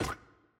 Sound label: Monkey Stuck Jaw; Puzzle